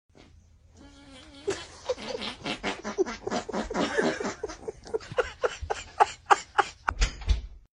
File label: kid fart stairs